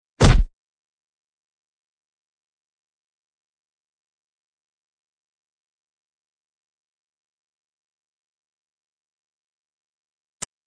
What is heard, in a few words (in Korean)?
Fights, 싸움-전투; 헐리웃특수효과음